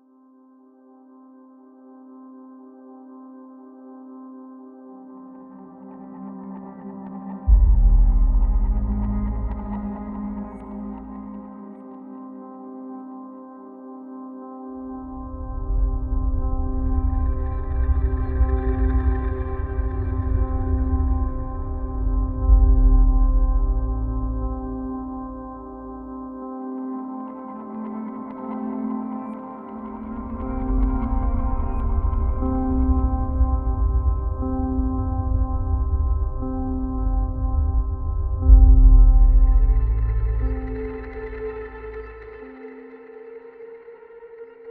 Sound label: Atmosphere